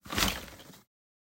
Duffle Bag Drop; SoundsCrate

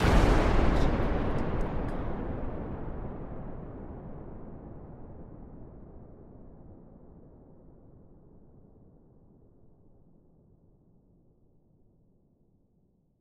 Explosion Blast; SoundsCrate

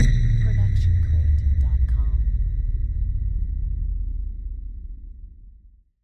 cinematic hit downer; soundscrate

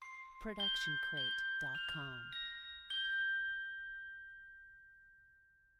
Bells Ident; SoundsCrate

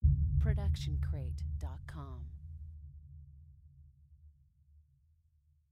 designer sub boom hit; SoundsCrate

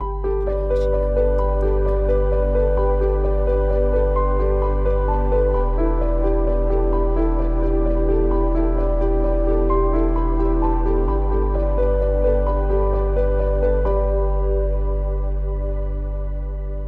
News Ident; SoundsCrate

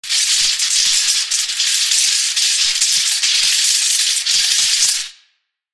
sparking lightning; soundscrate

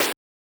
electronic snare 8bit; soundscrate

